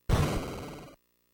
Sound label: Hit; adventure; video game music